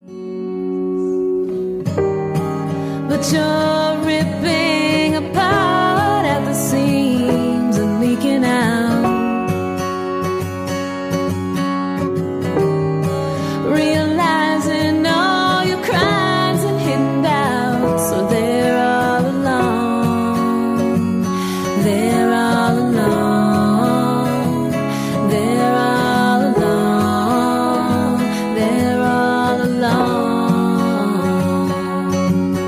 영어 여 노래

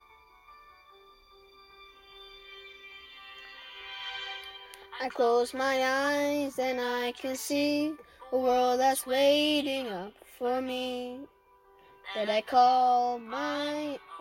영어남 노래

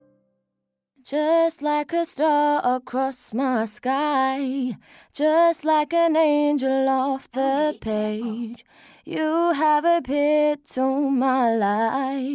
호주여 노래

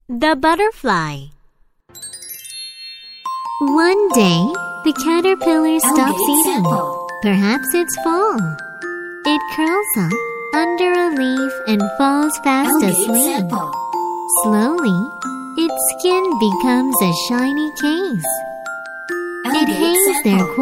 영어여 Song Woman

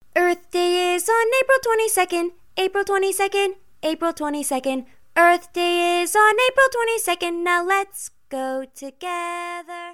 영어여 동요

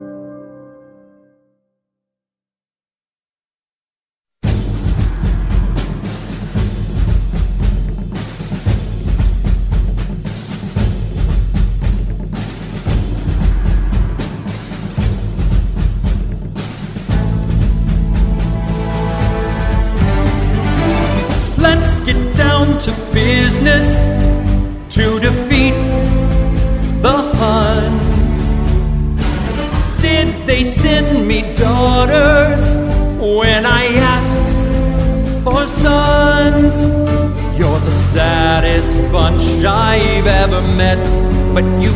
영어남 노래

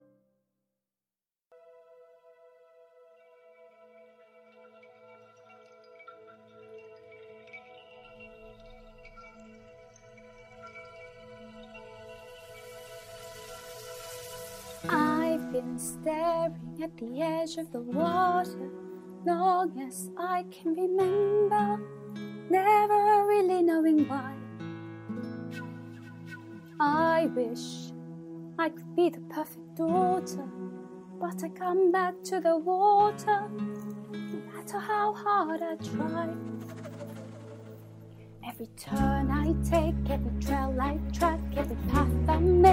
영국여 노래; 디즈니

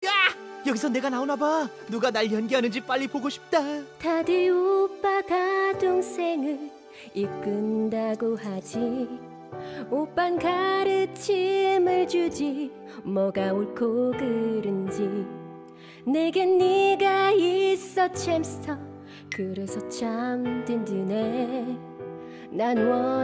뮤지컬노래; 햄스터와그레텔

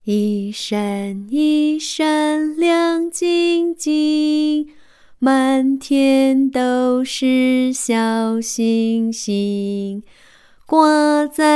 중국여 노래

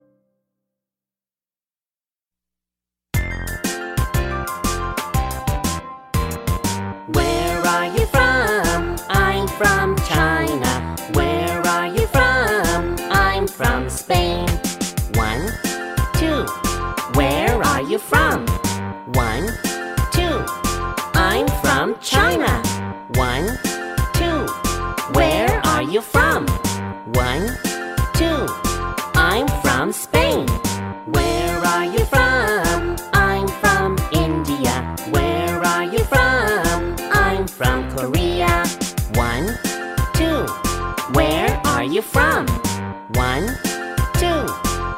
영국여 동요샘플

